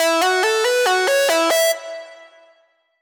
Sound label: dubstep club lead